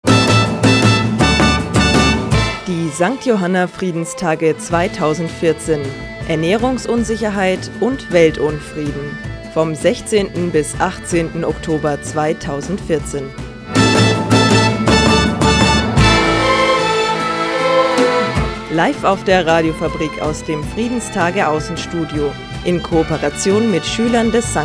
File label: Jingle; Friedenstage